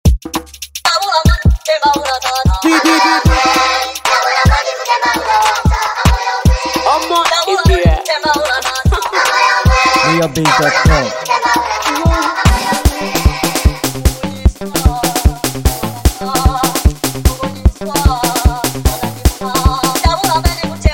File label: The Mosquito Sound